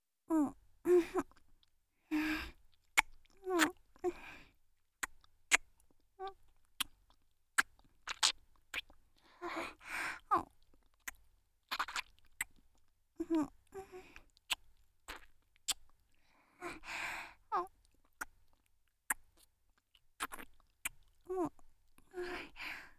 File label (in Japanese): ディープキス